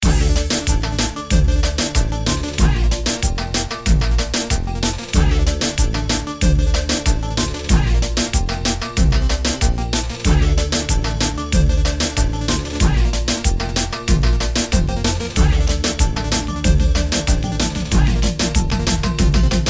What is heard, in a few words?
Reggaetone; AjamSonic